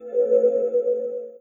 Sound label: portal open; spiritheroes